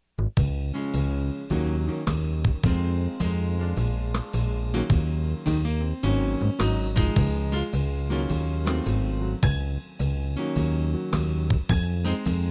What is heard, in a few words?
pianist; O Christmas Tree